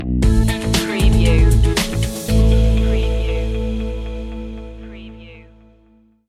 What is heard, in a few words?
fancy mood stinger